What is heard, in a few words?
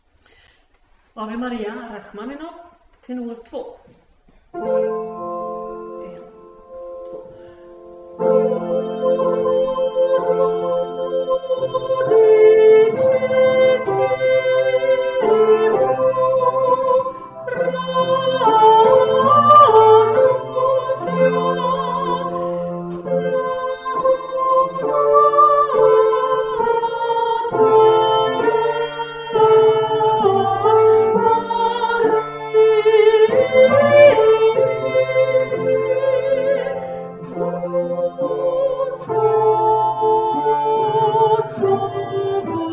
Ave Maria Rachmaninov tenor